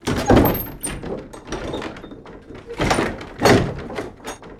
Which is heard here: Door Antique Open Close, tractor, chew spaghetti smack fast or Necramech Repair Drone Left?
Door Antique Open Close